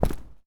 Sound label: Personnel Footsteps Concrete; DCS